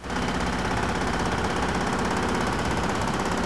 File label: Sound noise; Mr. Wonder's Greatest Toyshop on Earth; OmniMedia